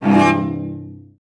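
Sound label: cellos C2; Best Service; Peter Siedlaczek's Advanced Orchestra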